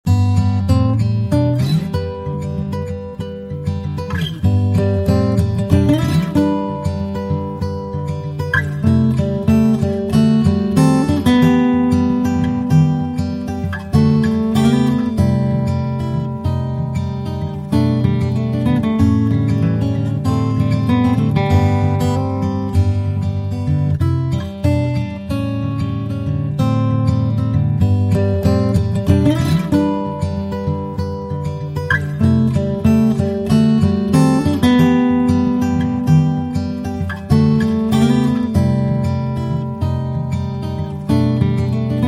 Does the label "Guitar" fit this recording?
Yes